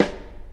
steel snare drum sample f sharp key

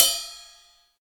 ride f sharp key

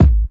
classic smooth steel kick drum sample g sharp key

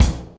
airy kick drum single hit c key

